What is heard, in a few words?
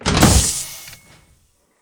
door open; odyssey